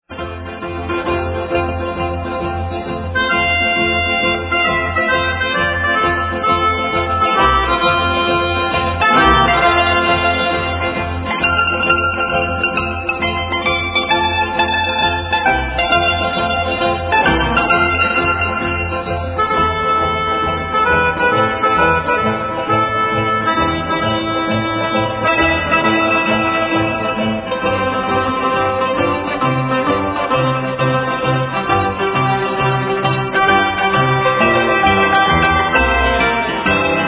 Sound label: mandolin